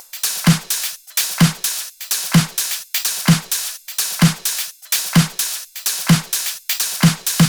Loops 128BPM, Drumloops

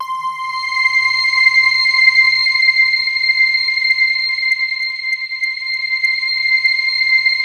SNOWFALL, MORPHEUS

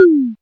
Rhythm Tom + Perc, Realistic Concertmate-670; Layered Kit

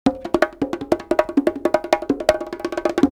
Live Perc Riffs; Jungle Frenzy 1

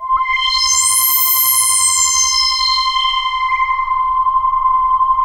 RESO C5, BASS; Best Service Dream Experience; R100M BASS

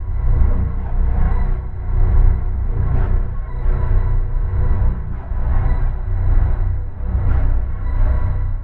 Monster Stereo Verb; Sonic Foundry (Sony Creative Software); Numina II

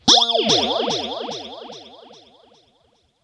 RIPPLES R, Funk Guitar; 90 s Sample CDs; Zero G

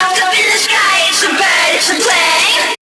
Bird Plane Dist

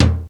Kick, Drum Machines; Ensoniq ASR-X Pro; 300 Drum Machines

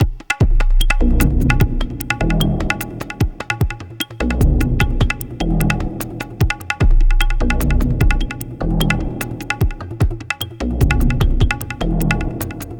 Tablatron, Processed Percussion

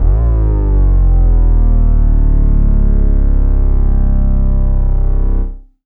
BASS Hooverboy; Polyend; Plughugger

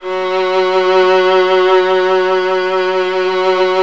Virtuoso Strings; ILIO; Kirk Hunter; F#1

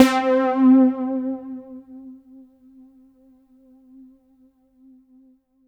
C5, Synth Line

